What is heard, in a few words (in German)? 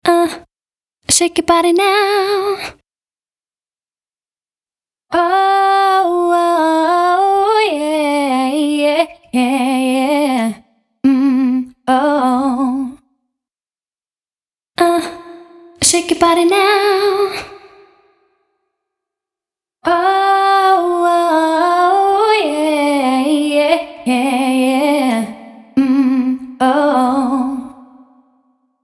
Voc ohne und mit Raum